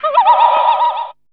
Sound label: DR. LOOP, TRANCE; X-Static Goldmine 3